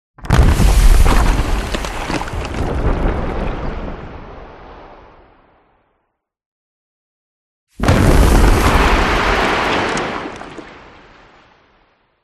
CINEMATIC IMPACT Eruption; smartsound

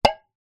tin can with water in hit; foley

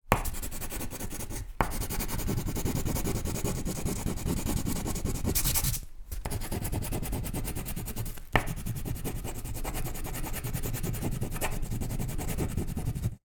office drawing colouring with crayon

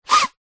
human blow nose into tissue paper short

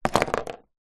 leisure toy plastic figure place down on table; zapsplat

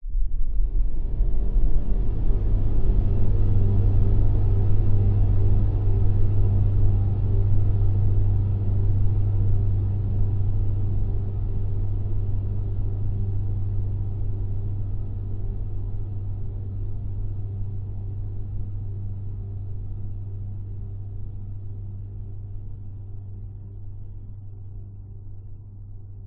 cinematic airy drone decaying sub rumble; zapsplat

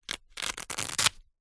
foley wallet open velcro slow

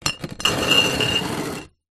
foley glass bottles x2 slide on dirty table

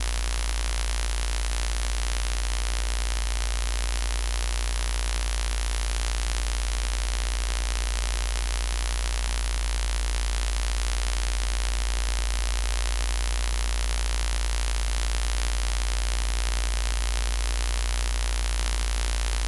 glitched tones AM Radio Interference

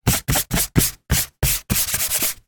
foley hand rub plastic surface; zapsplat

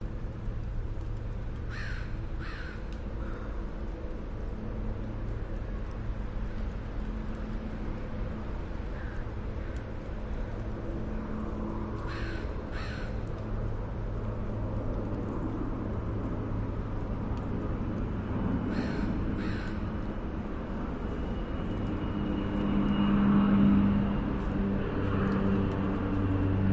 park airplane birds crackling noise; vancouver; stanley park; your free sounds

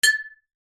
impact glass jar hit

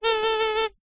leisure toy squeaky squeeze weak

